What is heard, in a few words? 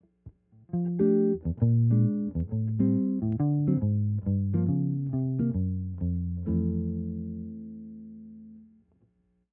Bassline